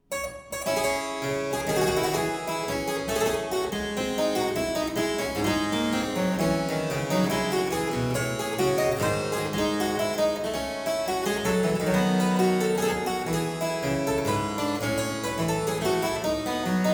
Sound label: Suite in D minor - Courante, harpsichord; Buxtehude; Complete Harpsichord Music